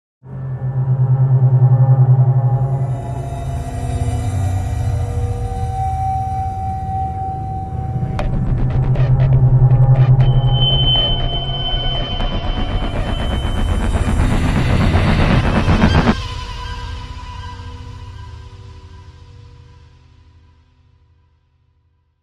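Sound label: Crime Sting, No Percussion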